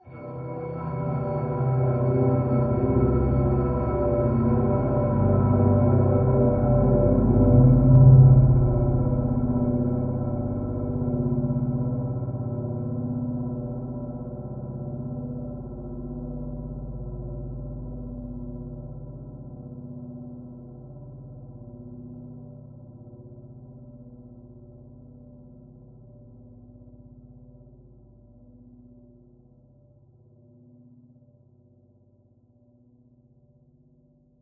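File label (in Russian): резко становится громким а потом медленно затихает низкий звук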